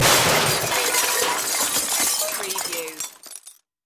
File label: Junk Crash; Action, Impacts & Explosions